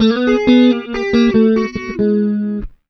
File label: 92 BLUES, Acoustic Guitar Loops; USB Soundscan vol.04; AKAI